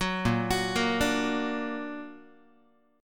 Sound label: Bb Augmented Bb, Guitar; arpeggiated; JGuitar